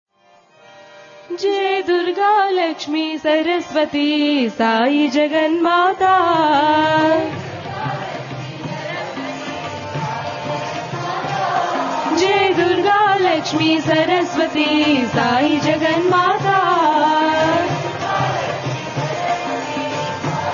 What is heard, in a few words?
AKHANDA BHAJAN; DEVI; JAI DURGA LAKSHMI SARASWATI